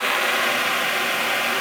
WEQS A4, ALTOSAX; Xsample VOL-2

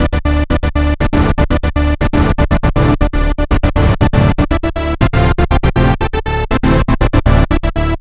Trance Synth

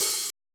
cymbal, Roland MC-909

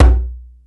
djembe open, Yamaha Motif ES drum machine; 300 Drum Machines; Yamaha Motif ES-Series

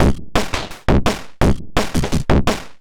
Beat Octofuzz 170, distorted drum loops 170bpm; musicradar

